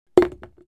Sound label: Golf ball in the hole